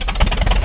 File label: coughing; fgdata